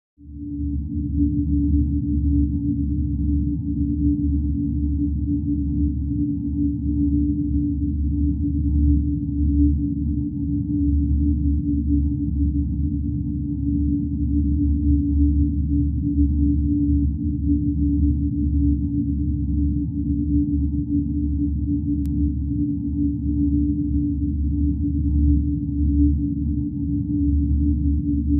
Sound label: Mother Ship Hum, spaceship fx